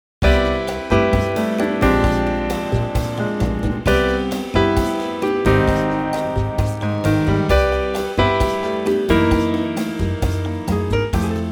C Latin

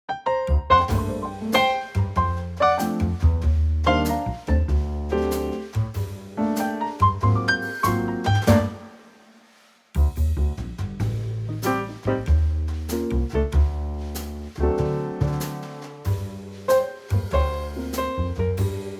Trio Bb, female

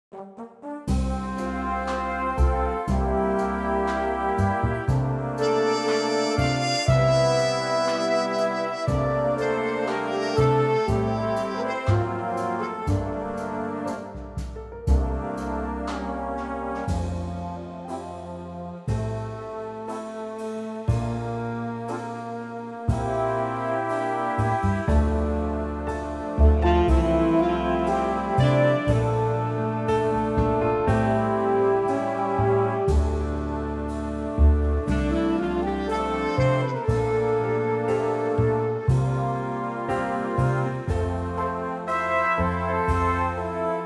Big Band F

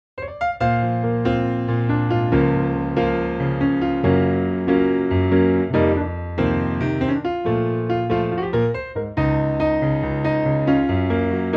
piano Bb

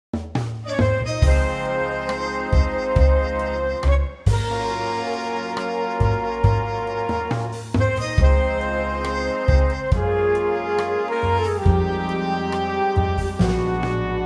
Db mellow Big Band

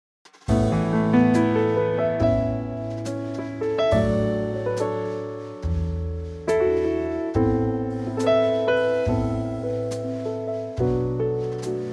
A Trio

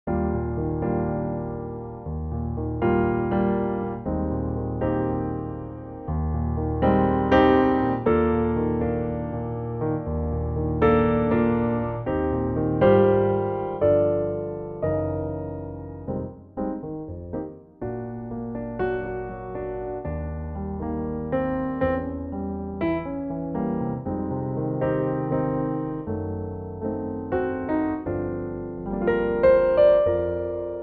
Eb Piano

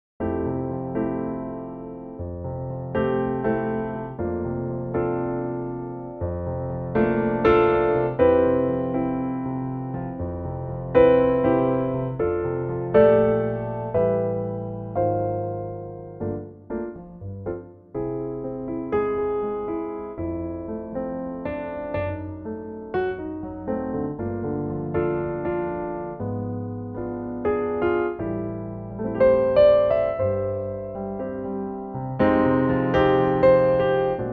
F Piano

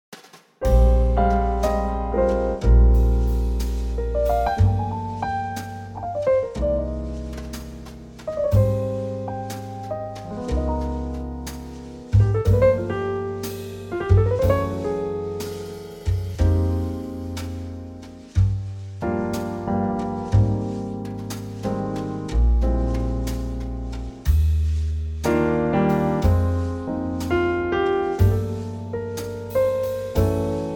Trio

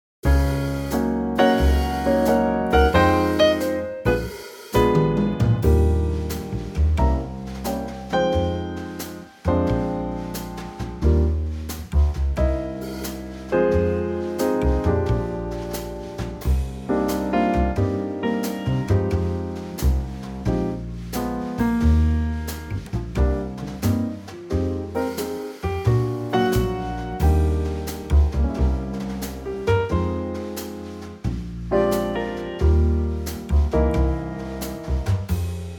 Trio Eb

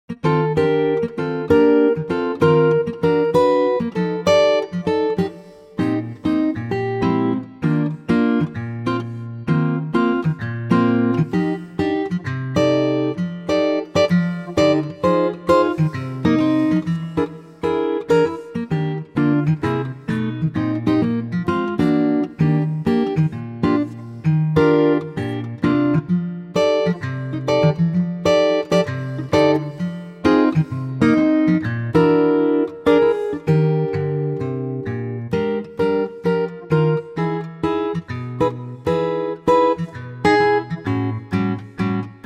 Db acoustic guitar; key - Db - vocal range - Gb to Ab; Lightly swinging acoustic guitar arrangement; bossa

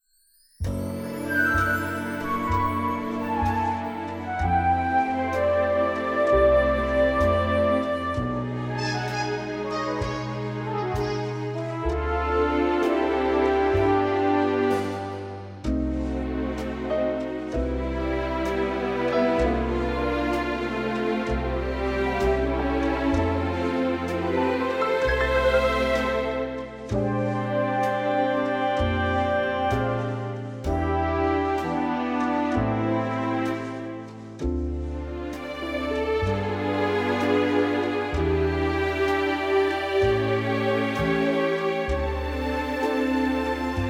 C orchestral; vocal range - G to D; Beautiful orchestral arrangement

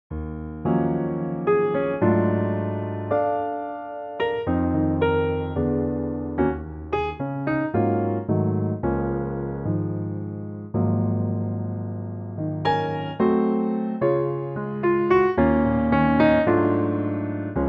Db Piano

